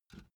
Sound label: filled can pick up hard